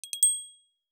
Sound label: Alert 3 Prominent; iOS 26 Sounds